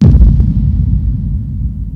EXPLO KIK, DRUMS; Crypton - DrumBase5000; CD DRUMS #2

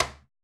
perc, Roland MC-909

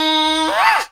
PERC FX

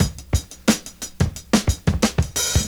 OLD SCHOOL, Breakbeats

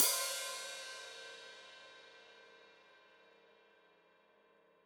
Hat Foot Open, Hi-Hat; Deeply Sampled Drums

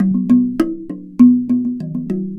Box Drum; Worldly Rhythms; Lover; Sonic Foundry (Sony Creative Software); Ma Ja Le Ethereal Textures

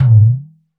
Udo Long, Udu, Percussion; Roland BK-5

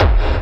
hardbassdrumz, breakcore; Bazzdrumz

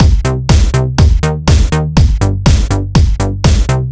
Full, 122bpm, Nu Dance Electro; Zero-G; Soundclash; Acidized Wavs; Combi Loops

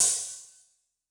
Lite Open, HiHat; Yamaha DTXpress-II

